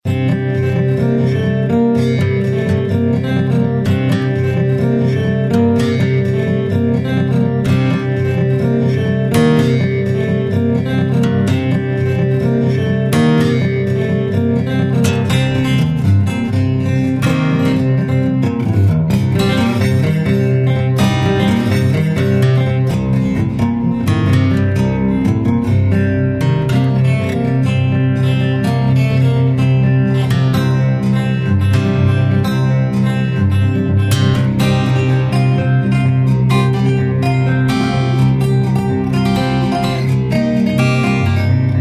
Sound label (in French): HAWAI GUITARE